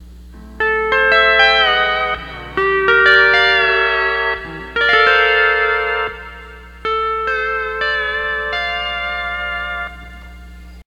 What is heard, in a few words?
12 String Lap